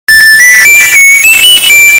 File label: loud sound; sonic